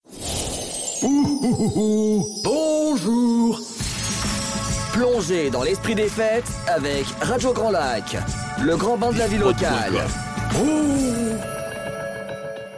Radio Jingle Noel; Radio Grand Lac

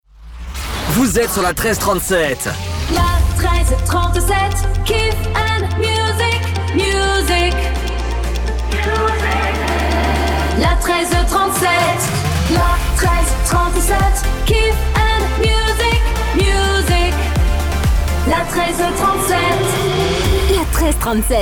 Top H Chante

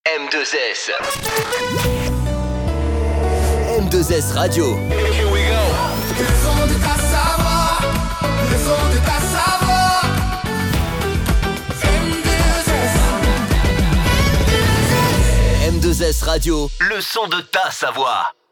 Jingle Chante; M2S

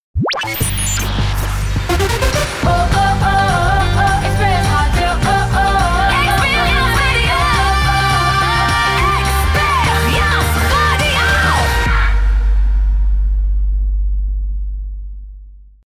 Radio Jingle Chante Pop Rock

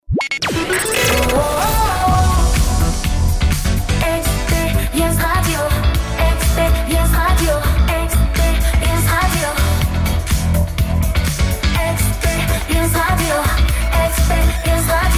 Radio Jingle Chante Nu Disco

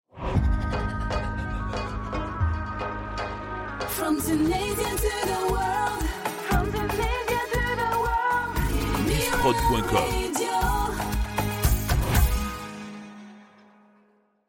Radio Jingle Chante; Myra

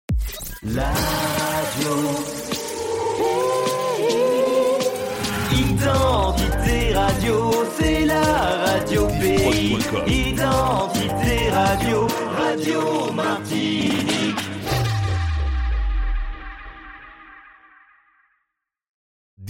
Jingle Chante Tropical; Identite Martinique

